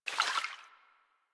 Vial Foley; Warframe; Warframes; Alchemist

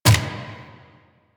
Turret Dry Fire; APC; Warframe; Lasrian

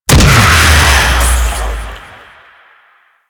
Death Dash; Warframe; Teralyst; Vomvalyst

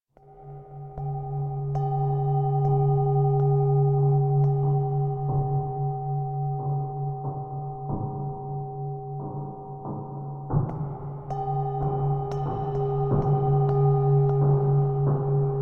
Amb Cue, Music; Warframe; DE; Hubs; Syndicate New Loka; Tenno

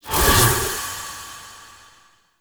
Ward Cast Healing Totem Accent; Powers; Fey; Founders

